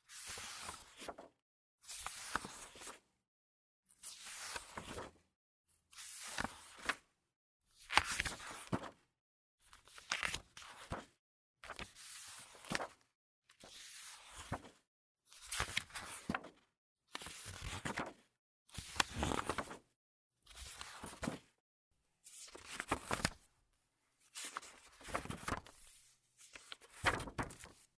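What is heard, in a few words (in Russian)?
звук переворачивания бумажных страниц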